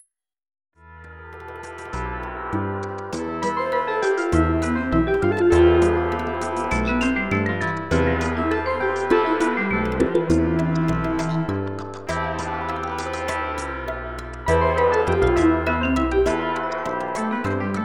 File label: drum